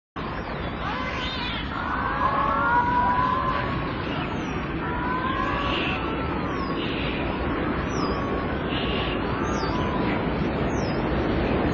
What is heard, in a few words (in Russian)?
delfin; Sakhalin; Kuril; sea; priroda sakhalina i kuril